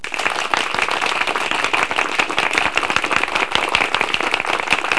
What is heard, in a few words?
applause